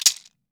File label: MARACAS; AKAI S-Series CD-ROM Sound Library VOL-5